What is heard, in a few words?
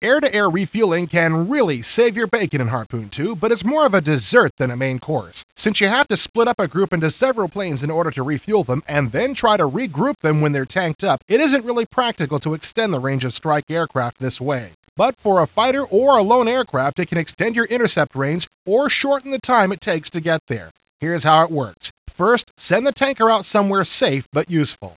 HARP